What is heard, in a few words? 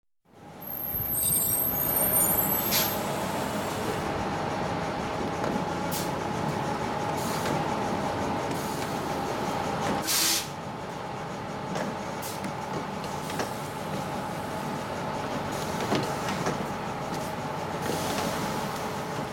Tractor Loading and Unloading